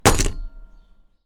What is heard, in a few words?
Drop Item Heavy